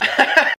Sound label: laugh